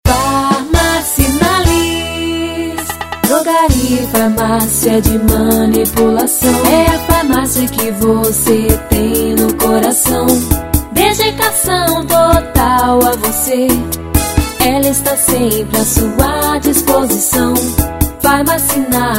jingle; farmacinallis

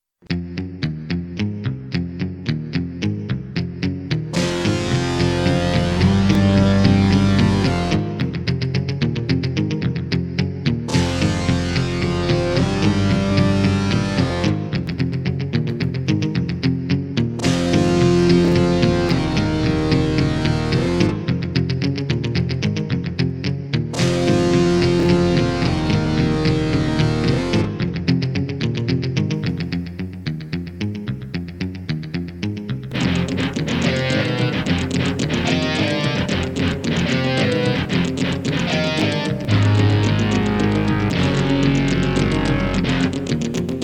guitar

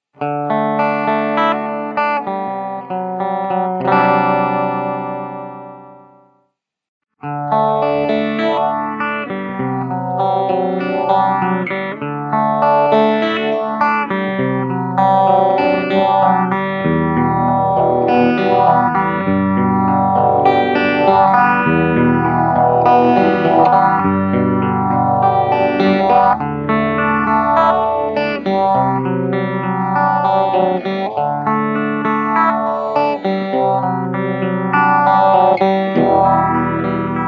crazy phaser; stardust pedals

